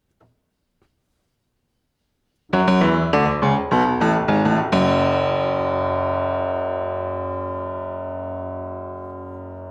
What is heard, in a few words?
Piano; keys